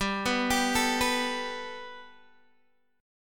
G Major Add 9th, Guitar, Open D; 17,14,17,15,14,x; down

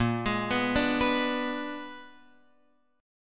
Bb 7th Flat 9th Bb, Guitar Open F; Bb7b9 arpeggiated

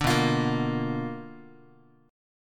B Minor Major 7th Flat 5th Guitar; strummed; JGuitar

